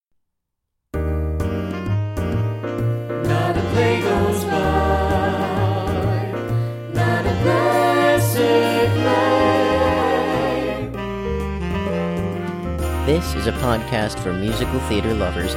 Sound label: intro music